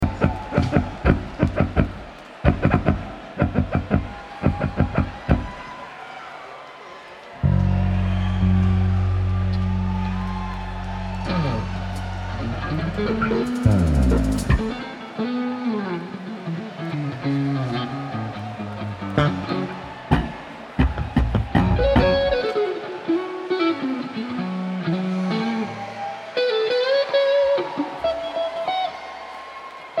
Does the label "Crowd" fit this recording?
Yes